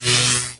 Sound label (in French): Robot Disparition; multiponk; android ios gamerip 2010